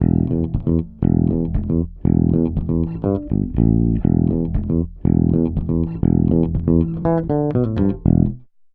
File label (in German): Tubesessor Bass Kompression; Heritage Audio